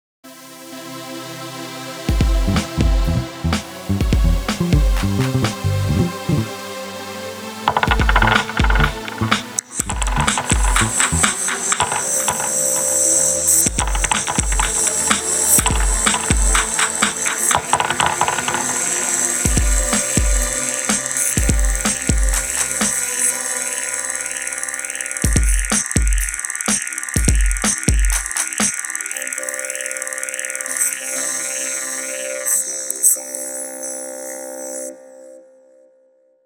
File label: Epic space sounds; Year 6 have been using IT in their music lessons to produce music inspired by Delia Derbys